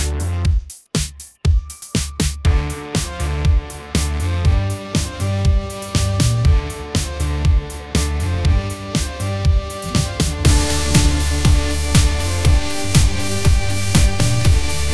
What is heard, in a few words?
pop groove